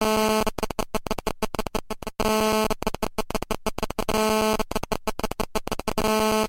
Anel Efeito iPhone

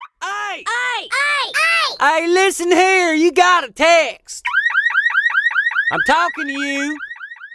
Alarme Text; toque de celular

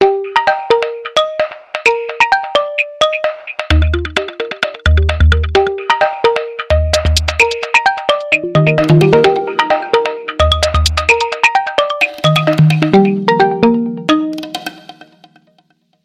Oppo A17k, toque de celular

